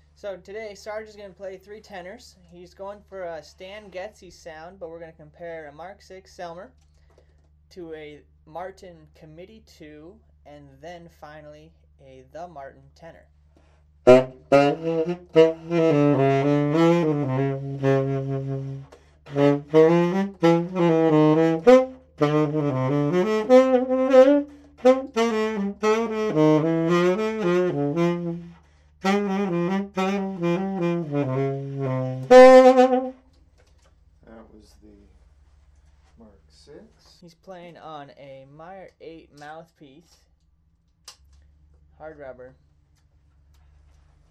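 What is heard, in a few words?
Tenors mk vi vs comm 2 vs comm 3, tenor saxophone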